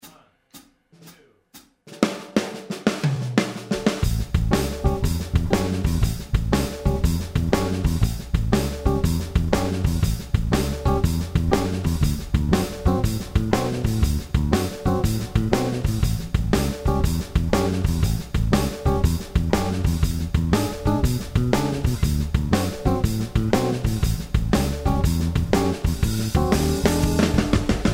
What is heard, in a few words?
Blues 120